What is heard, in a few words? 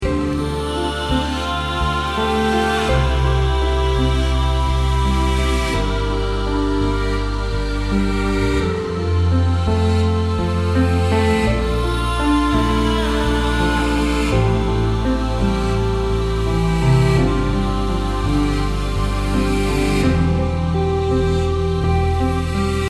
Healing Melody